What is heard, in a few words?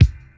focused reggae kick sample d key; Duration 0:01; Channels Mono